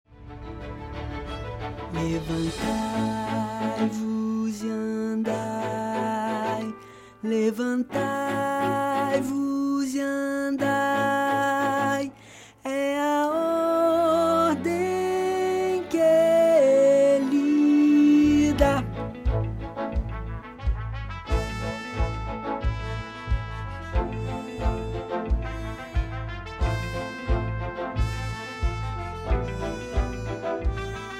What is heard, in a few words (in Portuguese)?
1o TENOR